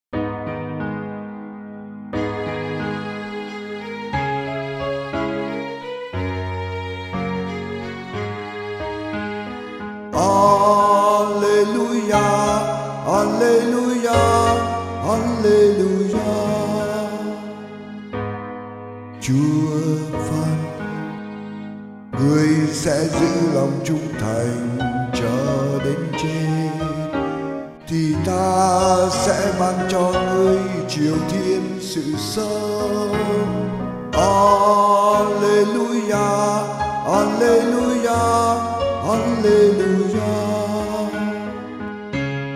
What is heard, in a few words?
alleluia song